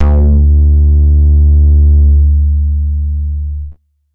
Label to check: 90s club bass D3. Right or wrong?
Right